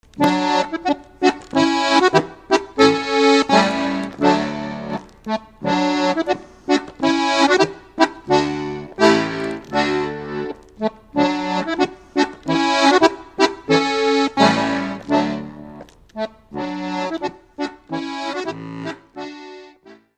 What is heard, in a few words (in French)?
Kuhlandler Dreher, Autriche